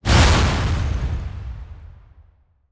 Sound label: COLLISION BASS